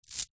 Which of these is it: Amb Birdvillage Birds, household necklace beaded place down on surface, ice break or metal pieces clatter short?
household necklace beaded place down on surface